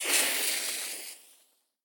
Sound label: fuse